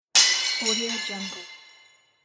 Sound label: dishes shattering